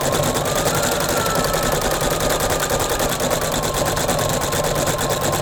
sewing machine auto sewing partial sewing close loop; fvtt sonniss gdc audio